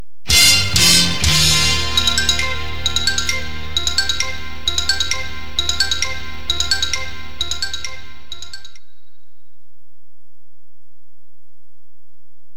news sounder

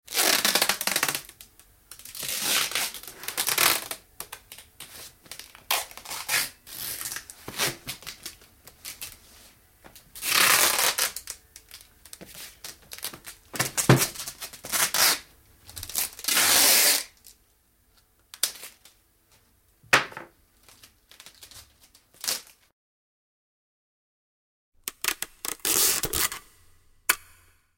Sticky Tape; 100 sound effects

